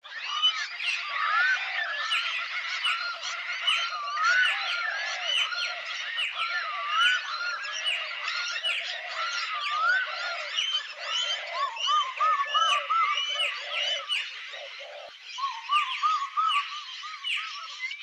bird calls; Straya

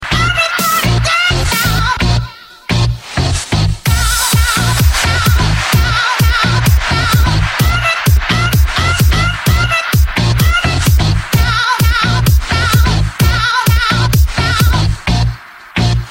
Electro

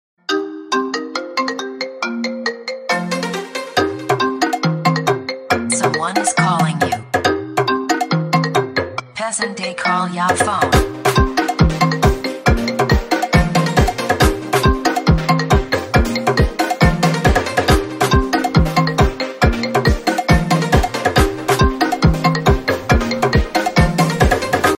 African ring; Iphone

